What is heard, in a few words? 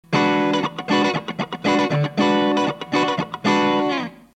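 4558, CLEAN, NJM4558D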